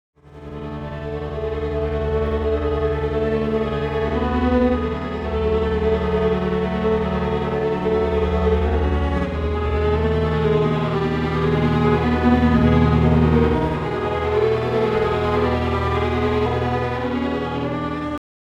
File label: strings; timestretch